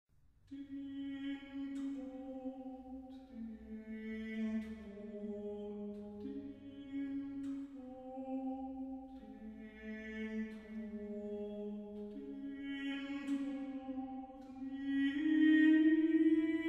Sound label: Chorale for 4 voices, single voice; 'Christ lag in Todesbanden'; BWV 278